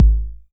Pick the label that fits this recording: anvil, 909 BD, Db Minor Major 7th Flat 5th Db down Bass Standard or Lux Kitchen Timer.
909 BD